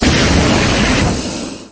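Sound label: fire single short sharp hit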